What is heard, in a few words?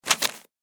Turning Paper Pages; Paper Sound Effects; office